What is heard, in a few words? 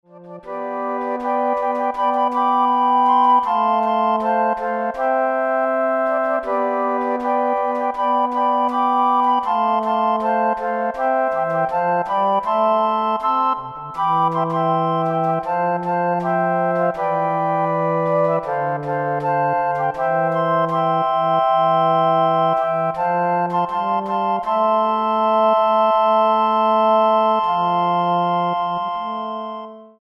Flute Ensemble